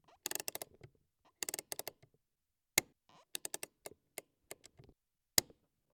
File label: Handbrake